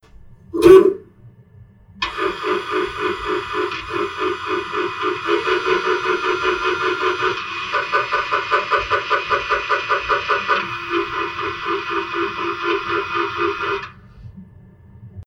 old Machine Boot BLP CH CH

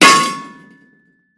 metal TRWNG

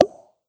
pop POP

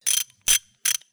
wrench CRNK CRNK CRNK

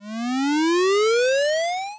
skware Fade Two Sec

